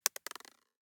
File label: DICE on Plastic, Throw and Roll, Mini, 1 One Die; Samples; ArticulatedSounds; DICE Sfx Party (Extended)